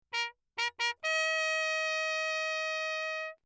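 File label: trumpet mute Bach signal; Perinétventiltrompete; Alessi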